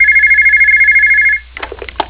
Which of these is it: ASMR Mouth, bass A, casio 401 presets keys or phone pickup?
phone pickup